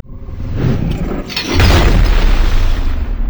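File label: emote recall wind up; nasus base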